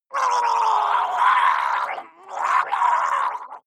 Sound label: water monster female gargle scream hard, comedy cartoon; soundpool